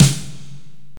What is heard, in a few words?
solid steel snare drum sound c sharp key